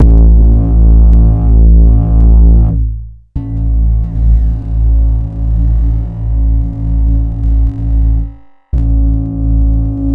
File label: Bass Drone; Sliced